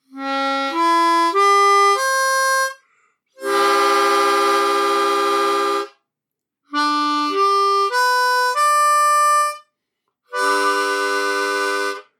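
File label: Blow Draw Chords, harmonica; Eastar; Major Blues Harmonica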